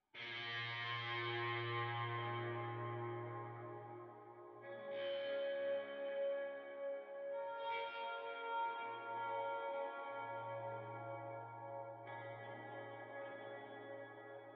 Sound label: guitar